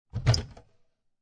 Cabinet Door Open Wooden, Household, Cabinet Door Open, Sticky, Wooden; Cabinet Doors